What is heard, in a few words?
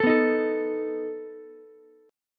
A Suspended 2nd Flat 5th A down Ukulele; Asus2b5 strummed